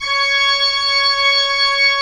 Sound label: Pipe Organ; E-MU Producer Series Vol. 2 – More Studio Essentials; Keyboards